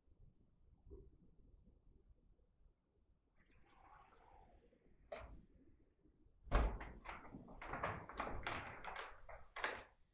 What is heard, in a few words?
2nd Floor Bedroom Read Room EVP; andrew bayne memorial library follow up investigation